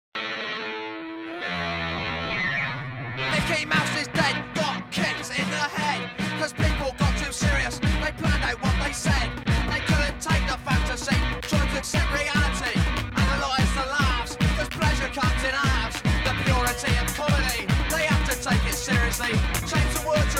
TB303

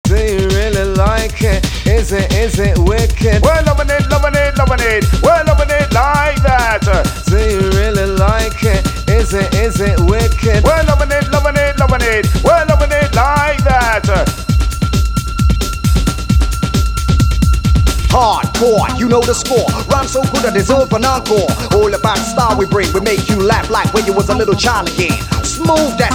acapella

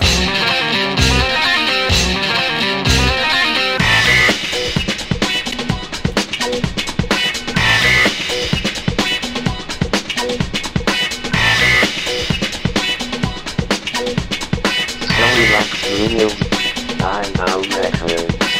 Guitar Groovez

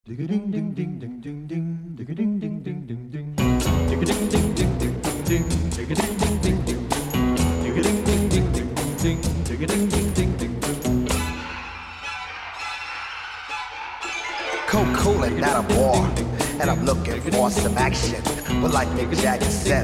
funky, 129

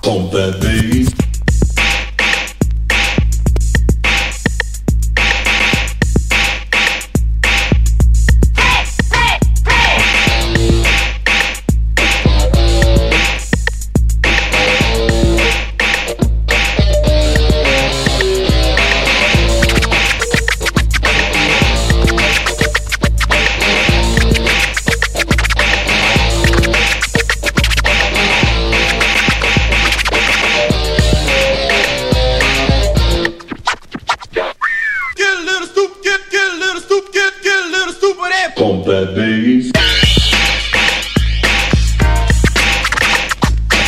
Pump That Bass

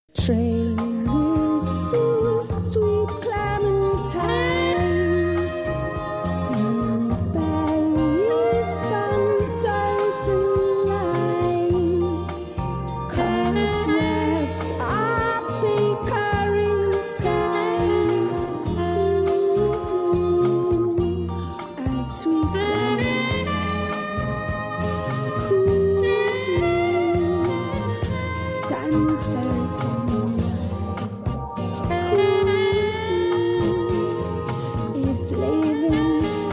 Saxy

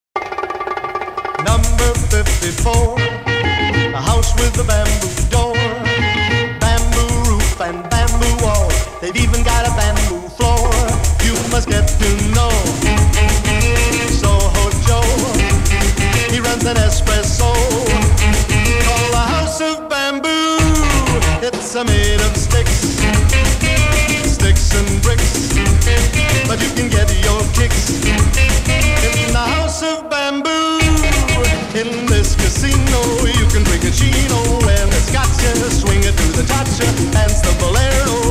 House Of Jungle Bamboo 95bpm

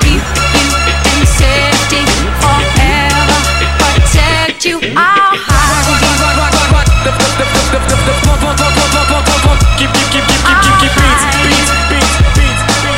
Funky Beats

